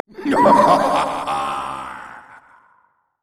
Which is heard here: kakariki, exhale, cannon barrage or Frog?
cannon barrage